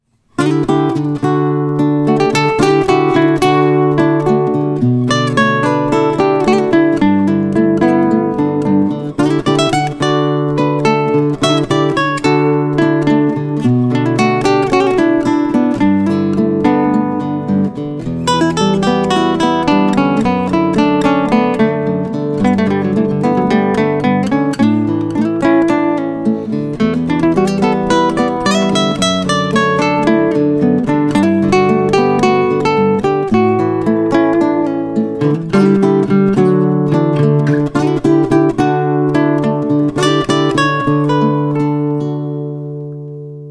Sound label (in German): Pop Ballade Mikrofon und Piezo und Solo